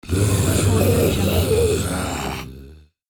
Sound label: zombies